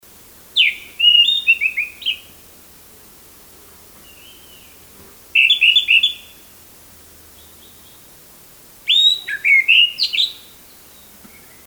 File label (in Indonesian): suara asli anis merah